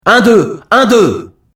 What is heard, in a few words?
concert; MOBICLIC63